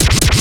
Scratching FX

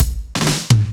Fills 128BPM, Clubsounds

